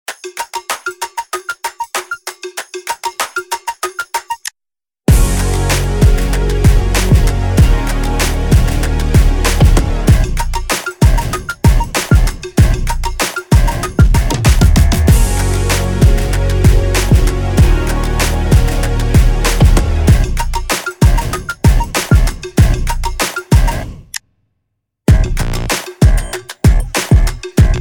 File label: instrumental